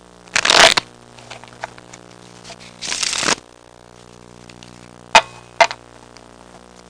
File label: dealing, household; Web Clip Empire 150,000